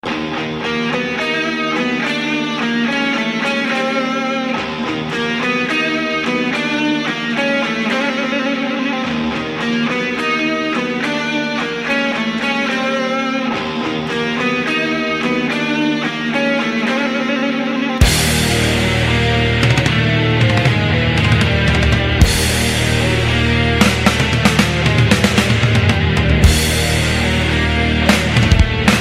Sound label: Killer Riff